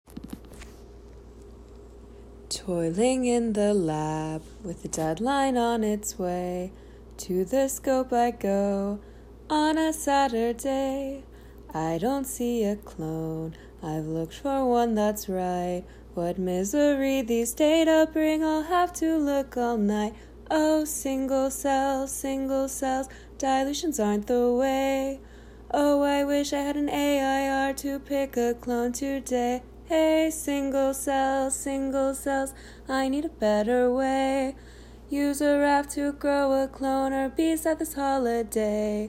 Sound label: Science Jingle; overcoming flow sorting challenges